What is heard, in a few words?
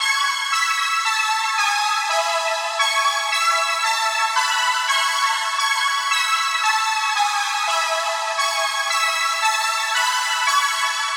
A Synth Lead, Synthwave; Flamingo; Synthwave Loops; Futurism